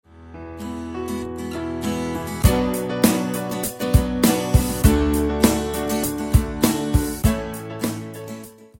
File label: theme song with fadein fadeout